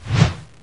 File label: swing; cof